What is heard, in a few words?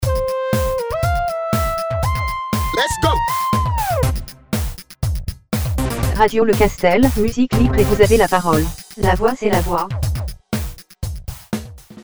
Jingle